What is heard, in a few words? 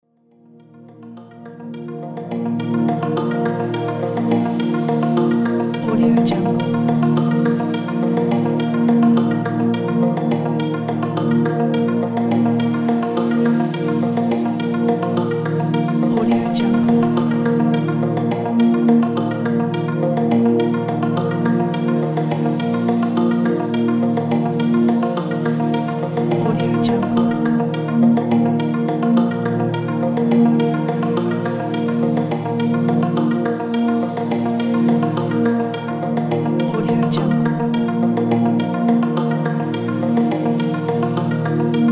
game countdown